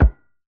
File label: projectile Type Cannonball; projectile data lab